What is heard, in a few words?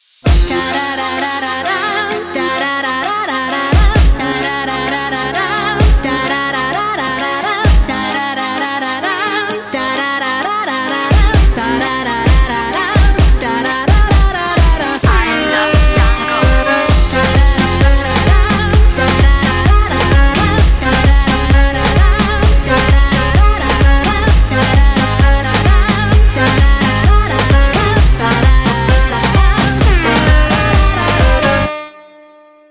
Tango